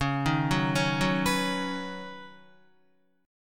C sharp 7th Suspended 2nd Suspended 4th C sharp Guitar; arpeggiated; chord; 8,6,6,6,6,7